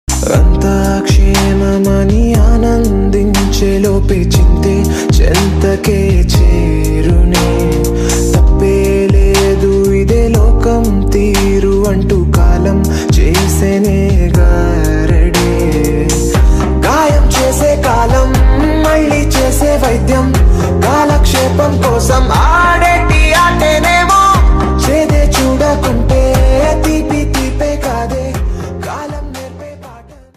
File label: song